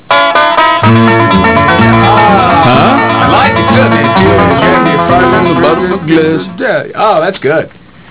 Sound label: banjo